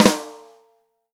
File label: Real Drum Kit, ROCK KIT; Best Service ProSamples vol.43 - Real Drum Kits